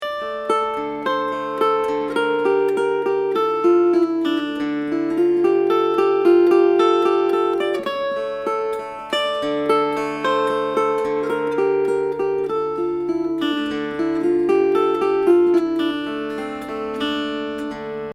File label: Snowy Owl; solace soundclips